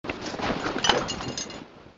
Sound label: large camera sound